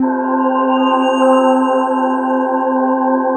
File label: PAD, 150 BPM, Drum & Bass; Ueberschall; Drum & Bass Flash Trackz